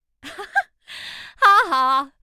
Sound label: haha; BATTD